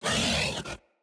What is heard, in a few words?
Kubrow Feral Vocal Melee Attack